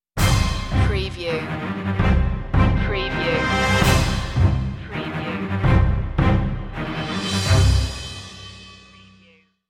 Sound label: sting no choir